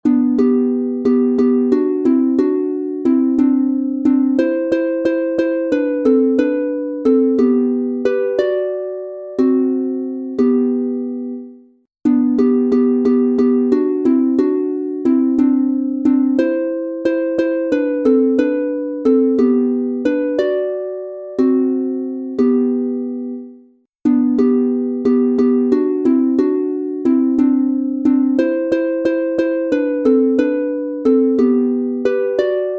G-Dur 2 st instr, Harfe; Kinderlieder; Laurentia

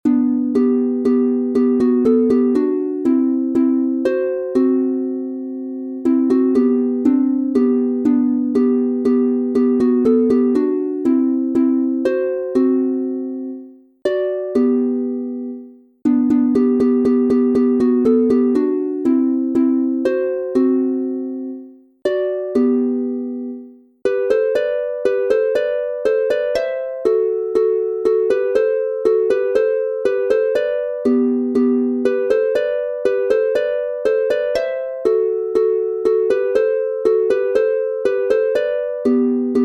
G-Dur 2 st instr, Harfe; Volksmusik aus Bayern; Es geht nichts ueber die Gemuetlichkeit

